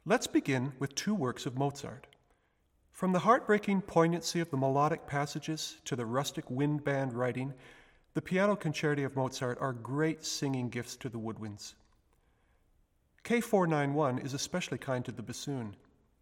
Piano Concerto, K.491, Orchestral Excerpts for Bassoon